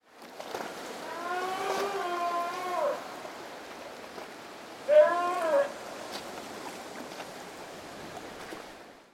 LOBO EN CUEVA ISLAS BALLESTAS

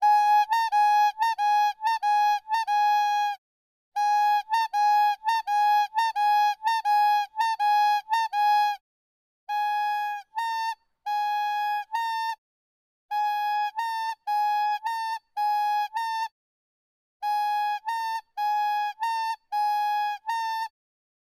PANADERO CORNETA DIFERENTES TOQUES

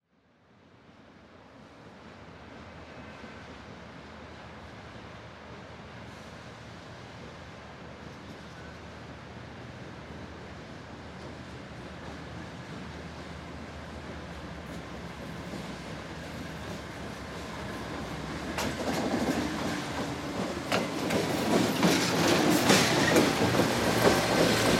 TREN LLEGA A ESTACIÓN DE DESAMPARADOS; transporte

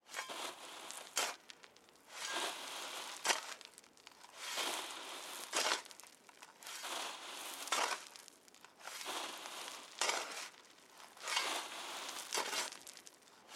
LAMPA LEVANTANDO ARENA Y PIEDRAS MENUDAS CUSCO; construcción; obra

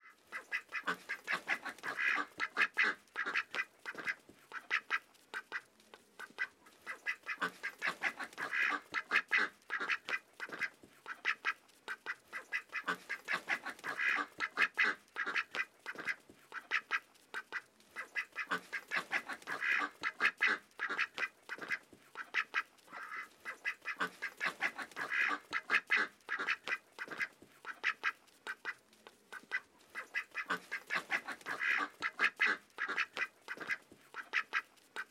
PATOS EN CORRAL CHIVAY; animal; animales; cua cua

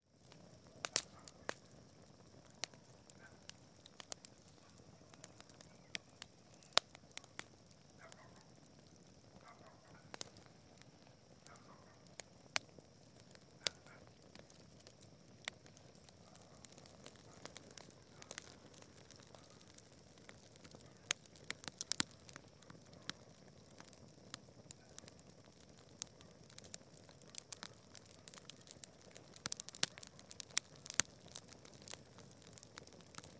FOGATA EN EL CAMPO

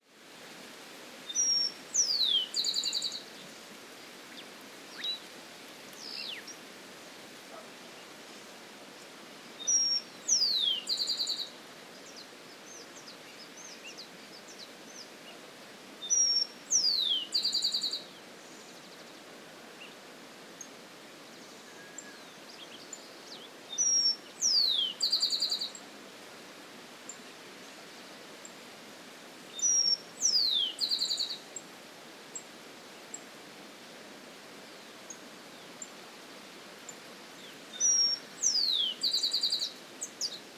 PAJARITO Y RÍO LEJANO CAJAMARCA

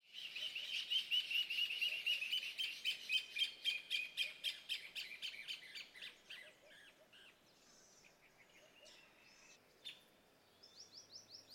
CAMPO FUNDO RÍO VERDE PIURA PÁJAROS Y RÍO AL FONDO

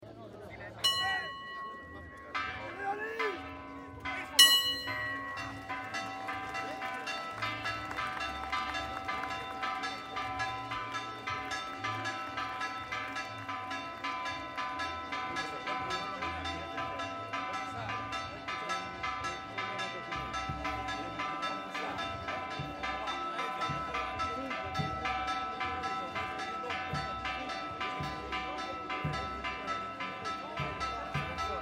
PROCESIÓN CAMPANADAS VARIAS; SEÑOR DE LOS MILAGROS

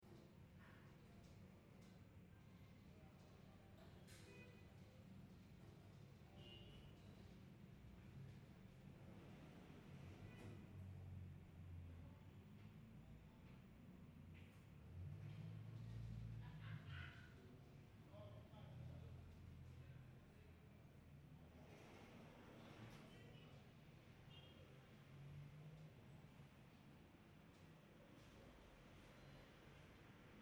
OFICINA JURIDICA INTERIOR CENTRO DE LIMA

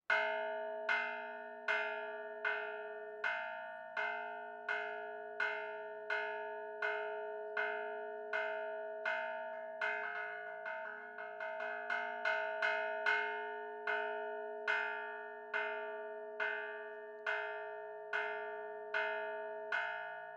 CAMPANARIO DE YANQUE EN EL COLCA TOQUE DE LLAMADO A LAS SOLTERAS EN SEMANA SANTA; arequipa

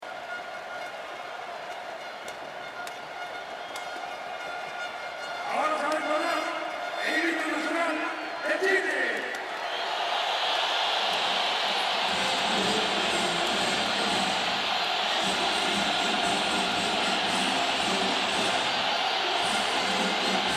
partido de fútbol himno nacional chileno con público; PERÚ VS CHILE

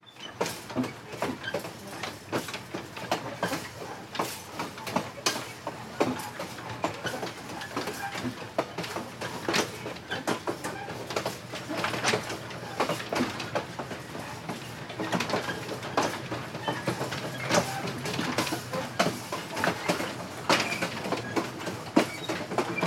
TREN PRIMER PLANO RUEDAS DESDE INTERIOR A BAJA VELOCIDAD; transporte

